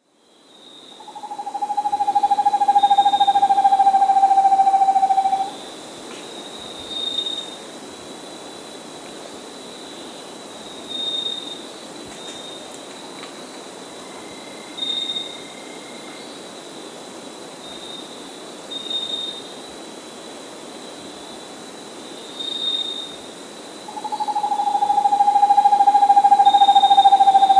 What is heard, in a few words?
Vermiculated Screech-Owl (Otus Guatemalae), Andean Birds; S. America